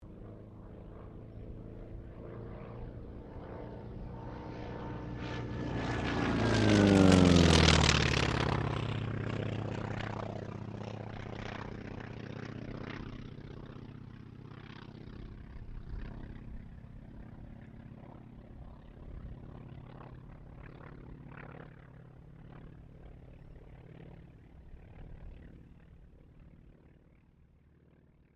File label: AIRCRAFT T-28D TROJAN PASS